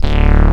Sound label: KORG D2, Rare Synthesizer; Hollywood Edge - Giorgio Moroder Rare Synthesizer Collection